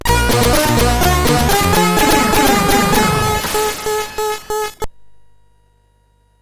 Game Music, sid CSG8580R5

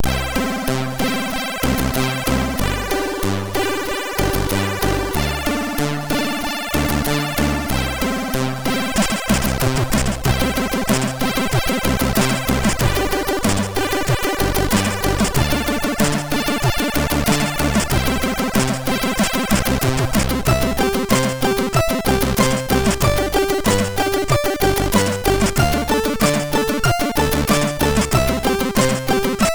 Diddling Saxes, MOS6581R3

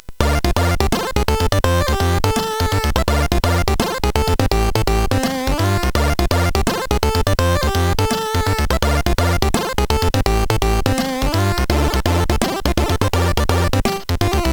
Su-Sweet, CSG8580R5; hvsc

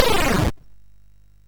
sid MOS6581R2, GAMES; Catalypse

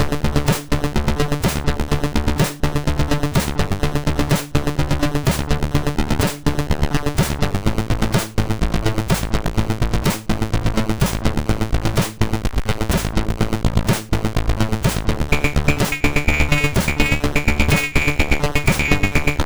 Techno, MOS6581R3; Moon

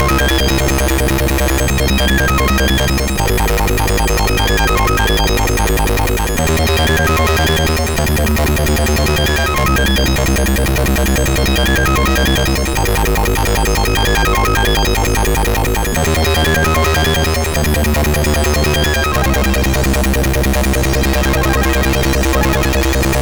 Intro Tune, MOS6581; MOS6581R4; Hotline

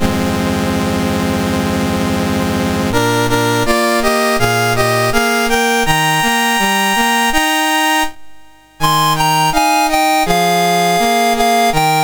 Afghanistan, National Anthems, MOS6581R2; Dave Marquis

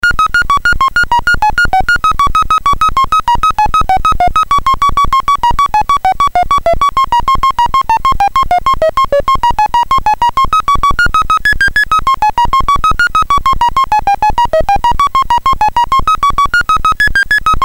Synthesizer BASIC, Commodore MOS6581R3

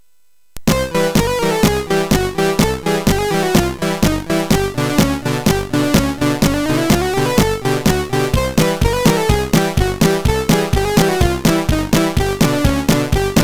Cirkus, MOS6581R3

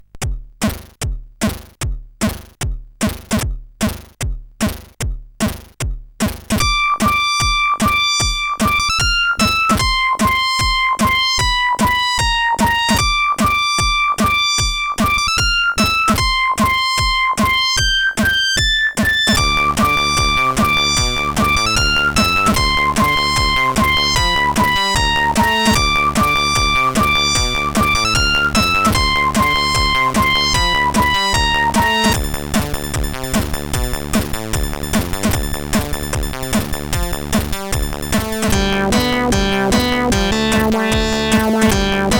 Space Loop, CSG8580R5; Vaz

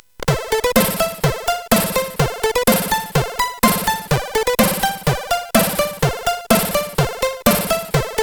Intro, CSG8580R5

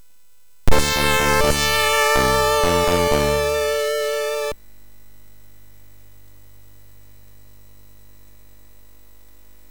sid, MOS6581R3; Vindicator; hvsc

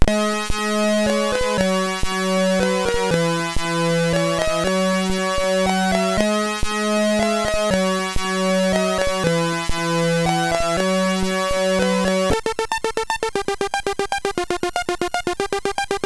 Dont Be Sad, MOS6581R4

